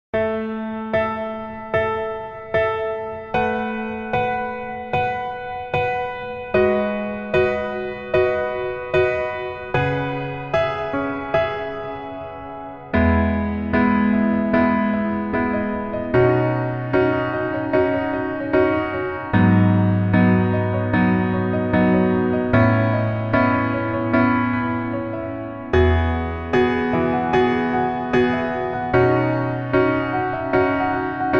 Piano 멜로디MR

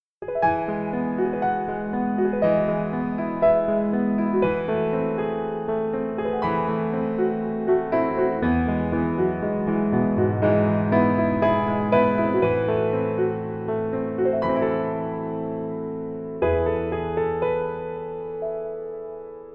Piano ver 멜로디MR -1키

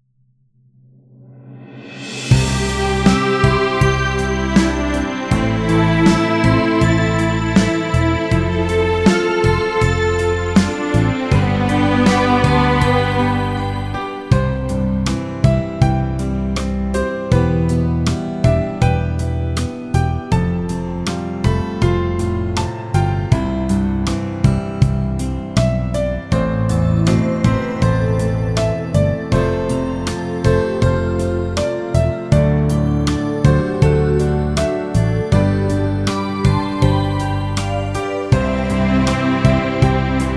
멜로디 Piano C Key

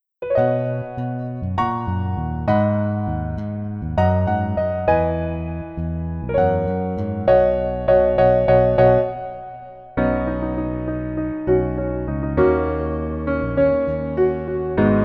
Piano ver 멜로디 MR

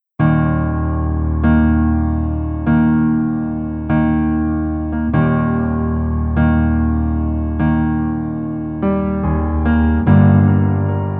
Piano ver 멜로디MR

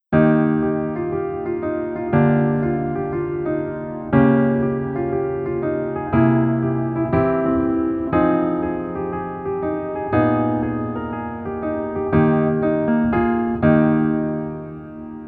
Piano ver 멜로디MR

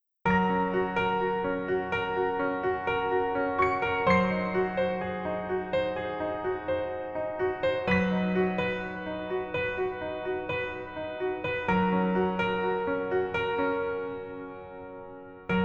Piano ver 멜로디MR 여자키

